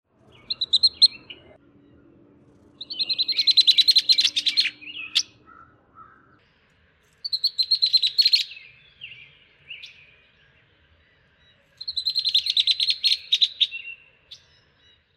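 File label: Blackbird Rusty Female Ch Ch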